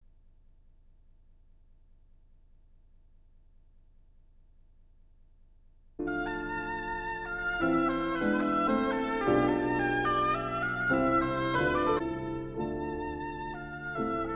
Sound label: Quartet, Nicht schnell; Jean Hubeau; Schumann 3 Romances Op94 I